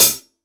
HH, PS 2002 HH

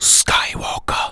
vox; Ueberschall; Techno Trance Essentials

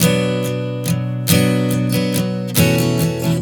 Strum 070 Bm, One Chord Strumming 070 bpm; Sonic Foundry (Sony Creative Software); Songwriter Acoustic Guitar Companion

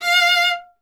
viola F; Miroslav Vitous; Solo Instruments VOL-1

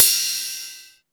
BELL, CYMBALS; CYMBALS 1

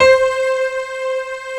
MIDI PNO, PIANO; East West; Global Sounds

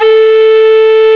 RECORDER, WIND

